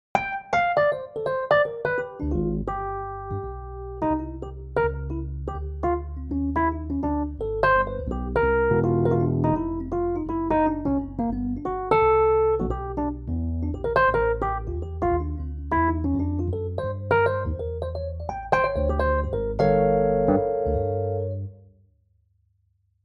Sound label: MKII Spark